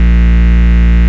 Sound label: Root A#, Ultimate Bass; Vengeance Samples